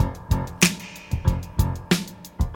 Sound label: 126 bpm drum loop f key